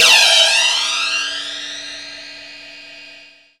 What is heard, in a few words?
PROCYMB, CYMBALS